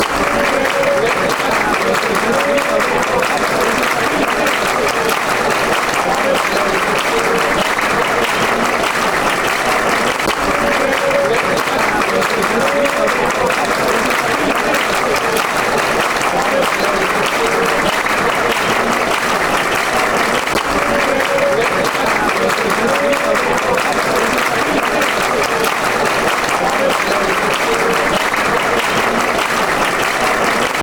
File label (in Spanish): aplausos